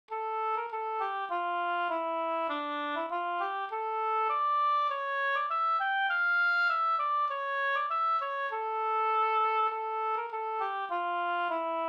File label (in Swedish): ganglat, Avskedslat; Erik Ronnlund